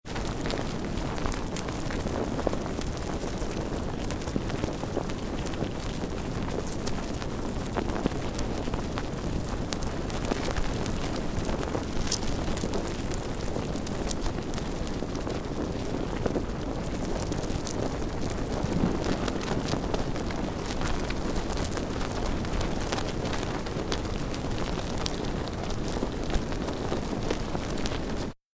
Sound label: Windigo Ants